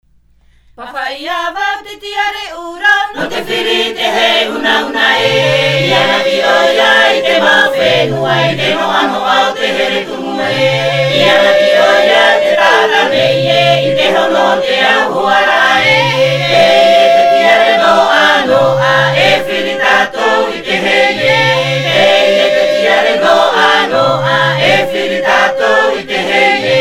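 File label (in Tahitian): Himene tarava Tahiti, chants polyphoniques maohi; Te taatoaraa o te mau reo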